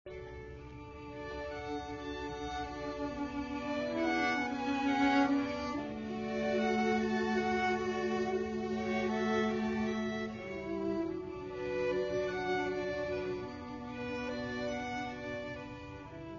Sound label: ave maria, Quartet; schubert